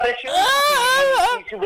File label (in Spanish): sonido perturbador